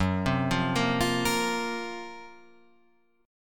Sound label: F sharp Major 7th Flat 5th, Guitar, Drop D